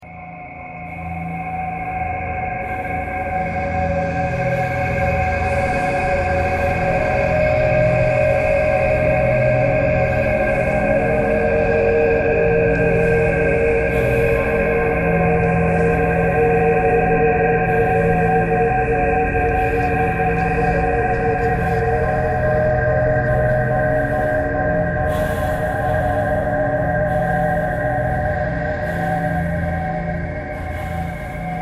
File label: traffic core birds thread harmonic